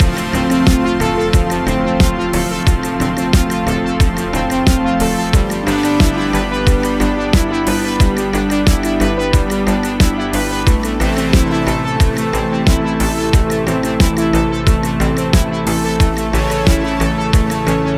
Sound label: Music Loopable 90; Wise The Wise Path 6